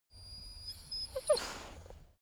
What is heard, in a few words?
dog teeth chatter squeak; natur-tiere; hunde